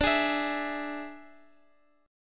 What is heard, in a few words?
D Suspended 2nd Flat 5th D, Guitar; strummed